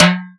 METAL, PERCUSSION; Drum Hits

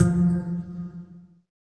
SYN PIZZ, JD 800

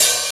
HH, Dance Mix

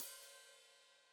Hat Foot Open, Hi-Hat; Deeply Sampled Drums

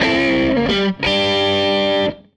Tele Guitar Loop

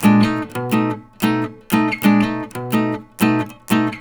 RIFFS, Blues Guitar; ILIO; Fretworks

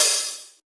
Old Skool House Open Hat

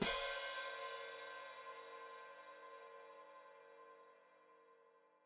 Hat Closed Open, Hi-Hat

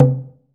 log drum; AKAI S6000 CD-ROM - Volume 5

